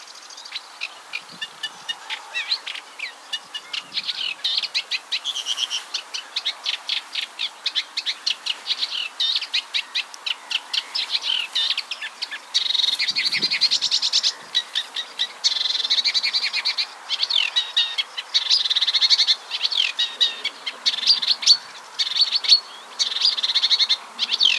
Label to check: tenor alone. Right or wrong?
Wrong